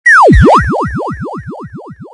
groovy Radar; Zoemtonen hisses pingen woesjes tikjes

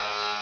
buzzer misc

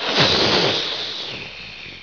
HISS

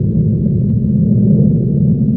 passby big ship loop

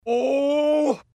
ooh; Simpsons; Homer

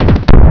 RUMBLE; sound effect; Klappen en Vallen

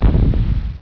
THUMP; sound effect

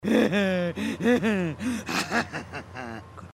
grunts; Simpsons

